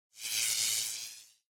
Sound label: Electric Shock Short